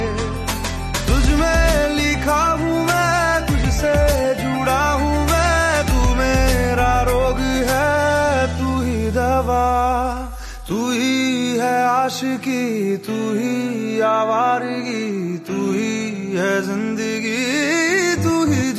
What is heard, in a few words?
Ringtone